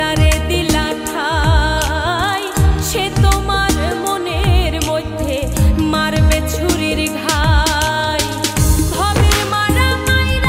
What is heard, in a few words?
Eid Bengali Ringtone